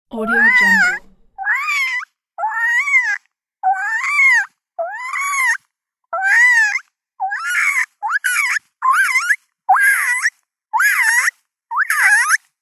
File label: cartoon baby creature crying